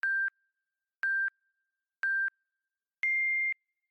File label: Workout Countdown Haptic, iOS Sounds